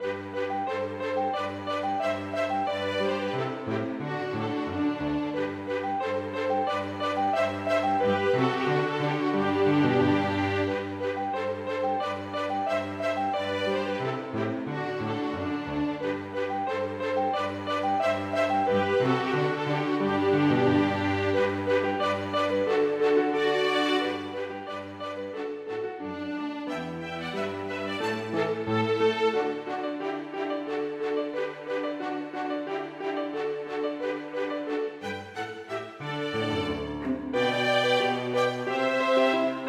Burleske for to fioliner, cello og piano

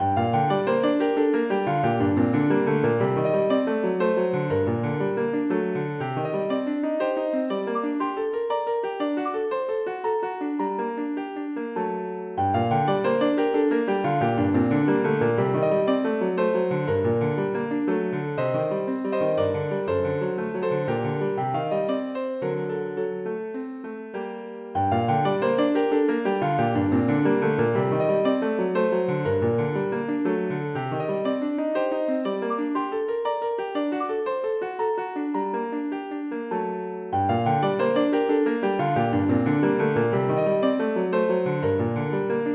Menuet for piano

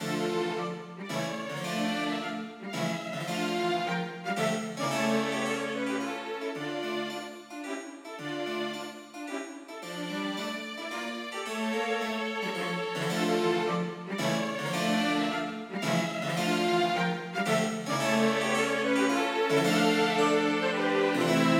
Menuet for to fioliner, cello og cembalo; Aamodt

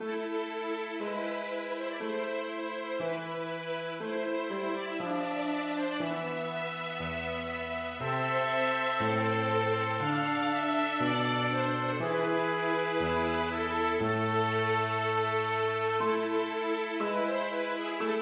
Aria for trompet, to fioliner og piano

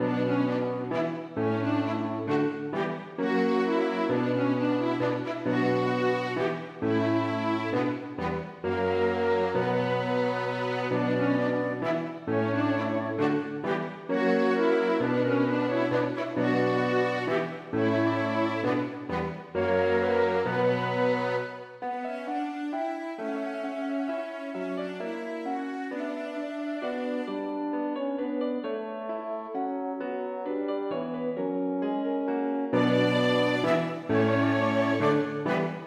Menuet for to fioliner, cello, fløyte, horn og piano

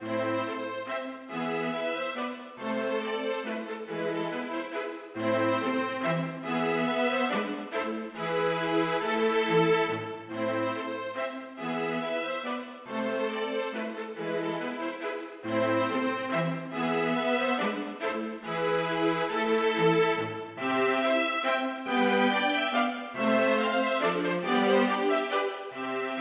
Menuet for strykekvartett; Aamodt

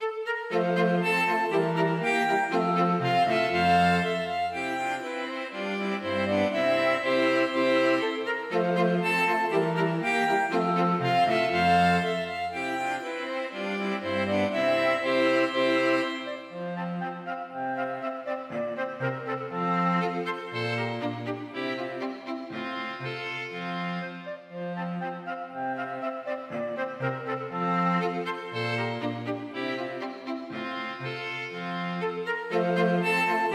Fejer for strykekvartett og klarinett

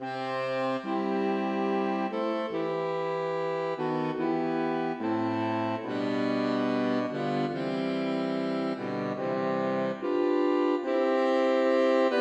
Sarabande for saksofonkvartett; Aamodt

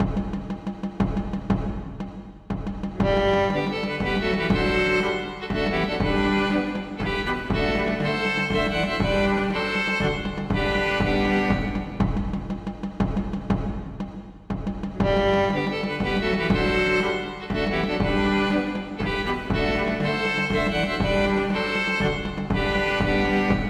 Engelsdans for strykekvartett og trommer; Aamodt

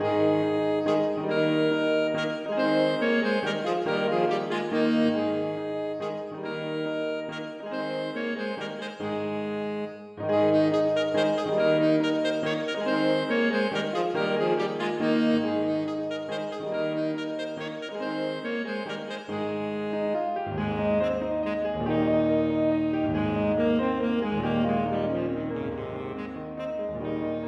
Menuet for altsaksofon, tenorsaksofon og piano; Aamodt 014k

